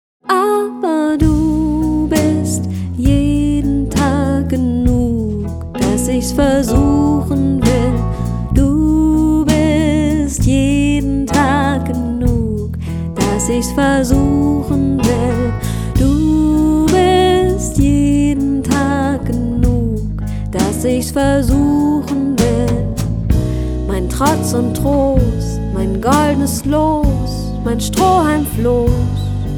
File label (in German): Kontrabass